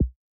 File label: pause hover